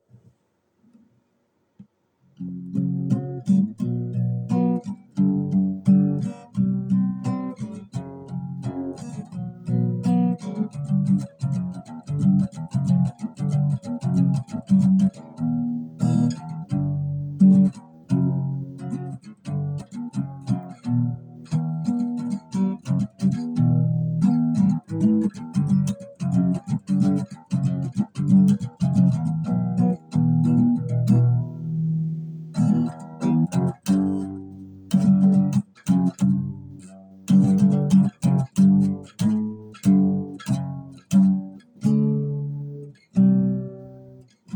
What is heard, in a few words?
guitar